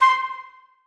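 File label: flutes staccato c5; Sonatina Symphonic Orchestra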